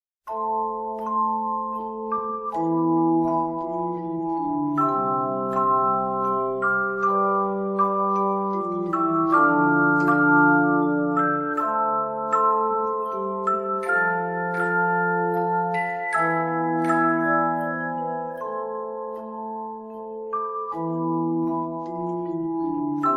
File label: joyous, carillon